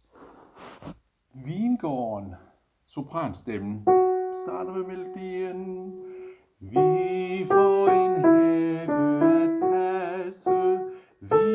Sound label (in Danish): sopran; harlev koret